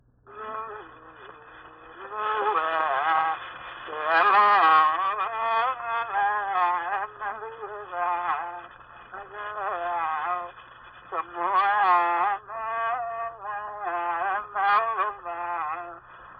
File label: Name chant, Hawaiian; World Music; for Lot Kamehameha; Na Leo Hawai'i Kahiko - Voices of Old Hawai'i